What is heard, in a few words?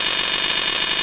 buzz; quake2; inland